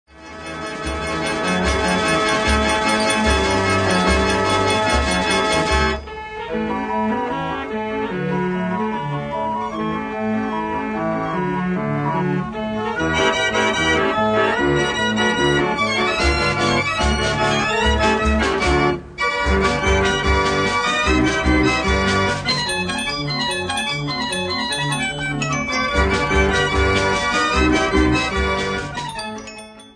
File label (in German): Kirmesorgel